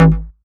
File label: Synth Cuts; Vengeance Samples; VES1 Instruments